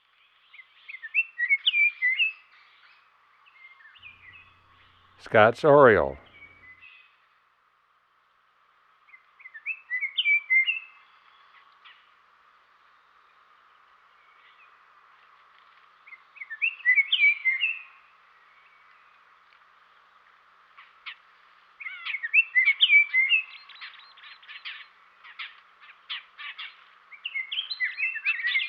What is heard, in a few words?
Scott's Oriole; Western North America; Dan Gibson; Wildlife Identification By Sound